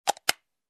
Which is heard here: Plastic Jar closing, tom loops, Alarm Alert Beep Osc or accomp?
Plastic Jar closing